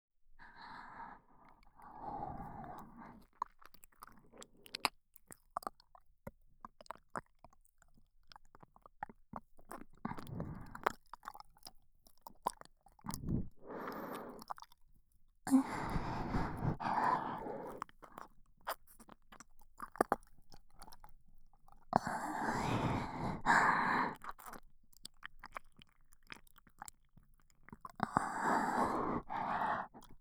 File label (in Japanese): 耳舐め, ASMR; SEなし